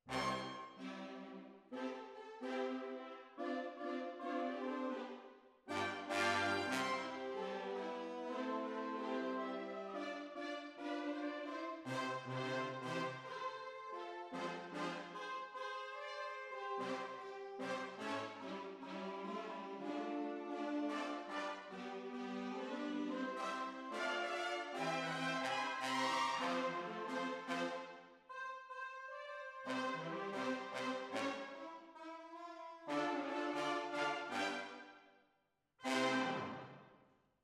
Hall

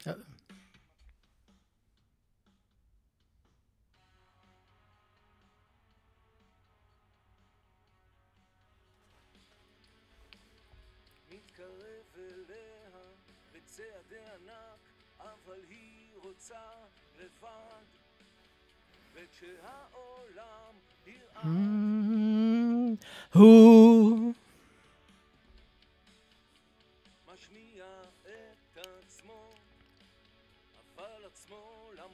VOC

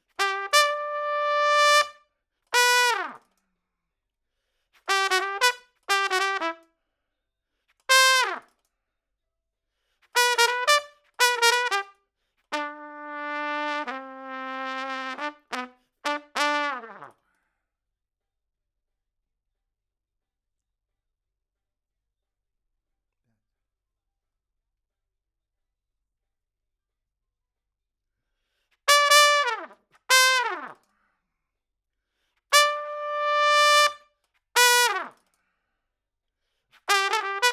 Trump

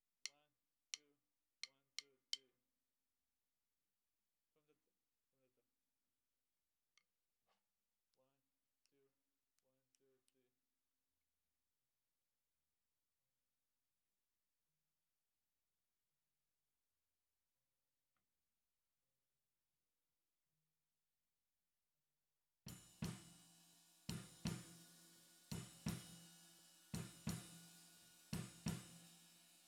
SNR

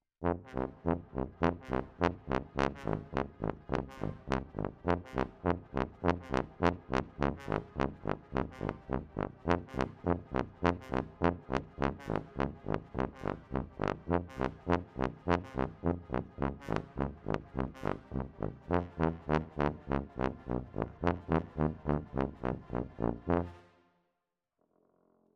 Tuba, jazz for piano

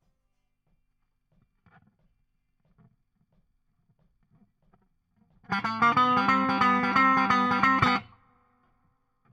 Guit Solo Back

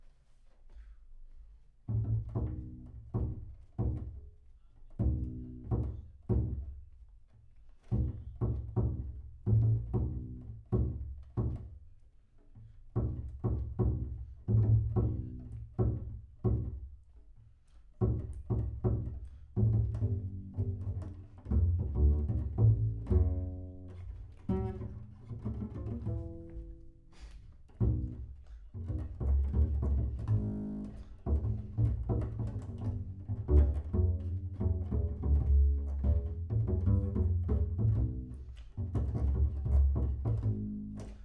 Bass Room

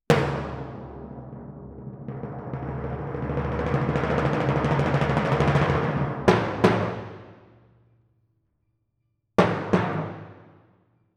Twin F Timp

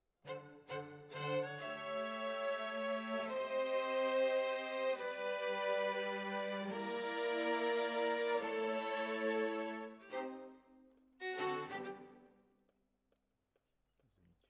Violins, jazz for piano

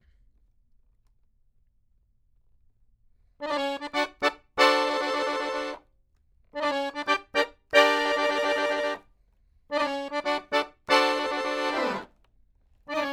Accordion

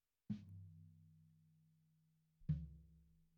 BD In

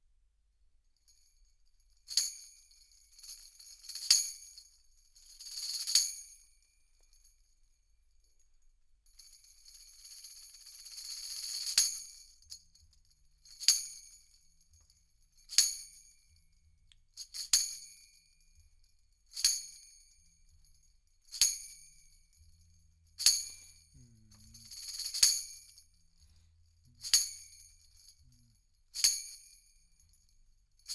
kit

